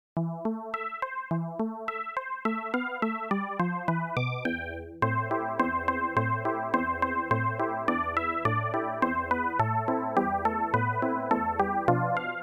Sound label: TIC TOCK